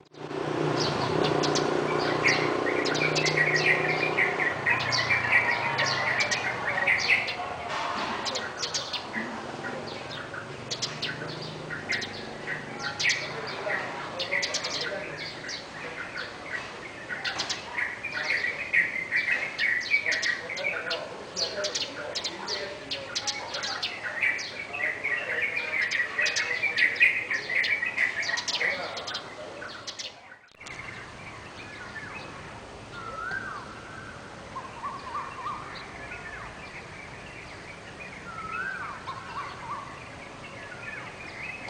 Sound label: Penang esplanade morning sound